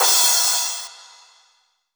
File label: Crash, Cymbals; Vengeance Samples; VEH3 Cymbals